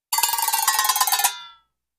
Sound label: Processed, Descending Cowbell Shakes.; chimes & bells